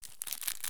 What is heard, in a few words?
crackers draw; Escape from Tarkov